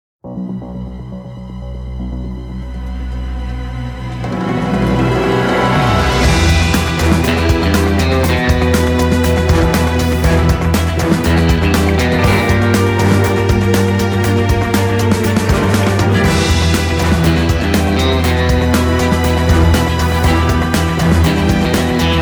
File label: Trailer Orchestral Hybrid; MisterX